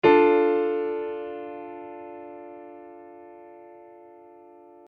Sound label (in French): mineur